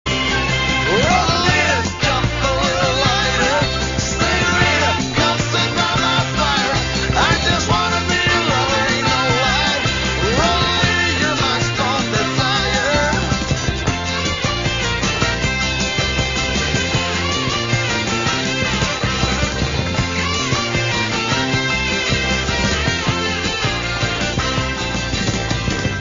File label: chorus